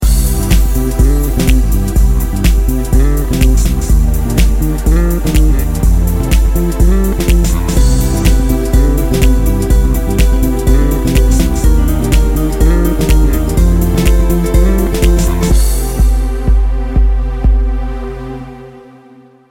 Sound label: Summer Groove